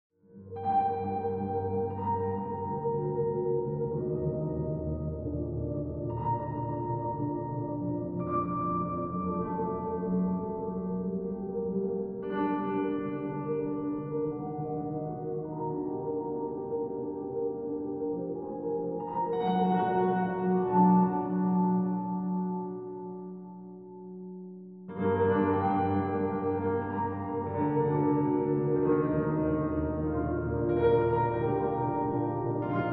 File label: piano; magistral